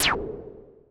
zap, Roland MC-909

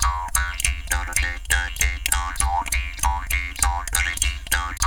JAW GROOVES, Didgeridoo; Big Fish Audio - Didgeridoo and Other Primitive Instruments

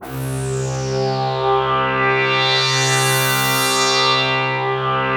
SYNT, 180 BPM; Ueberschall; Drum & Bass Resonance

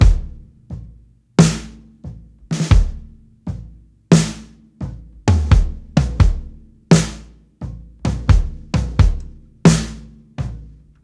SLOW TOMS, breaks, drums; Basement drums vol 2

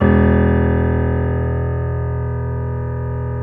PNO MF; Synclavier Sampler Library

